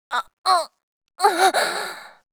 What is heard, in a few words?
low health; macha